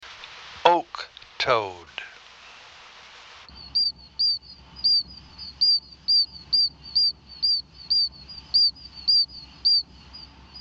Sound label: Oak toad (Bufo quercicus); Family Bufonidae - Toads